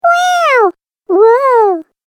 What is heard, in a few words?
Cartoon Game Voice Amazed Sounds